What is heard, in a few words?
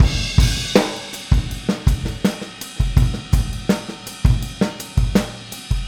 Boogaloo Chorus Full Mix, 82 BPM